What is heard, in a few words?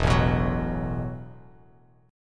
E Suspended 2nd Suspended 4th E, Bass Standard; strummed; JGuitar